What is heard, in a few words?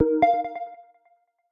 Dudu, notifications; Lenovo; ZUI 13